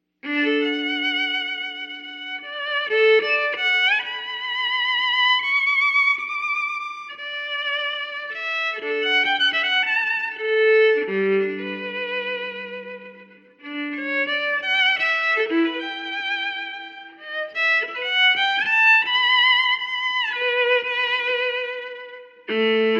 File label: meditation, The Violin; tais